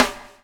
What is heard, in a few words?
BUBL; Spectrasonics - Backbeat